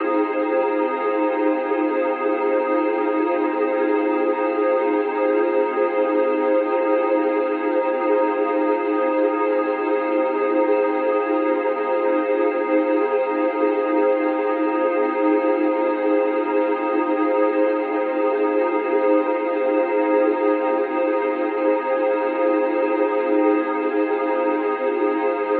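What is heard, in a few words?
pad loop hollow 150 F minor; Virtual Riot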